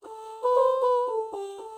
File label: ah oh melody morph